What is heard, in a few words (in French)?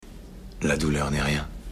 douleur